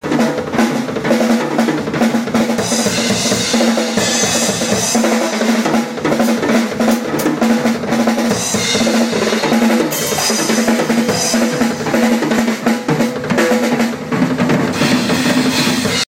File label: drum solo; odery fluence fusion